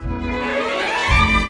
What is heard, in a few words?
old string rise; Orchestral